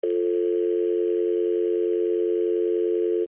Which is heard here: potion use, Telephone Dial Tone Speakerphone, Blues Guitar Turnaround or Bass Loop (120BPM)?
Telephone Dial Tone Speakerphone